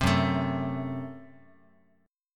F sharp Diminished F sharp, Guitar; strummed; 4A,4E,5A,5D,5G#,6C#; 3,2,0,x,x,x

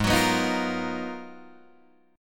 Ab Minor 13th, Ab, Guitar, Drop D

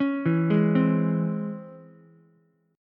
C sharp Minor Major 7th C sharp Ukulele; C#mM7 arpeggiated; 6,4,4,3; JGuitar

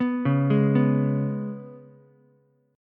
Ab Minor Double Flat 5th Ab down Ukulele; arpeggiated; JGuitar

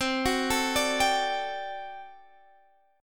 G Major 7th Suspended 2nd Suspended 4th

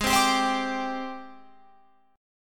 Ab Major 7th Flat 5th Ab, down, Guitar, Open F; AbM7b5 strummed

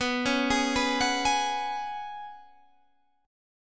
Gb Suspended 2nd Suspended 4th B

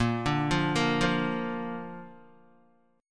Bb Major, Guitar; arpeggiated; JGuitar

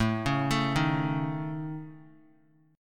A Major Flat 5th, Guitar; AMb5; arpeggiated; JGuitar

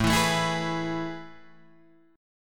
A Major 7th Suspended 2nd, Guitar, Drop D

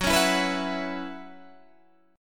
B Suspended 2nd Suspended 4th, F sharp, chord

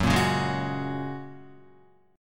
Gb Major Add 9th, down, Guitar; strummed; JGuitar

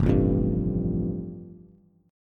F Major Add 9th, Bass; Fadd9 strummed; JGuitar

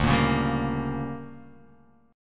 D Major 7th Suspended 4th Sharp 5th, chord

